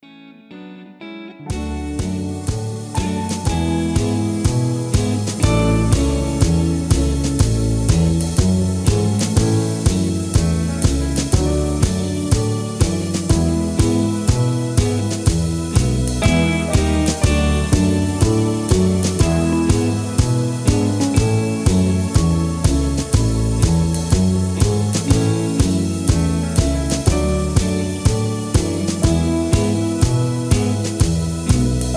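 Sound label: Instrumental without background vocals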